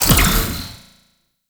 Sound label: Void Dagger Proj Shot, melee weapons; Warframe; Zariman Dagger; Void Mode